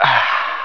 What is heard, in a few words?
Relaxing Man